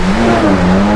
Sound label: jetski hard turn; hl2